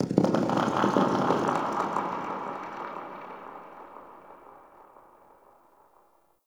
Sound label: Exterior Space Rings Details, Ambience; Warframe; Railjack